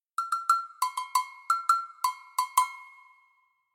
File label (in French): Cloches